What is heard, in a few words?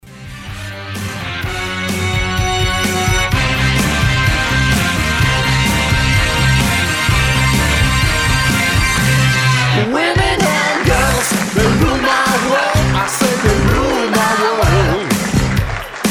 kiss live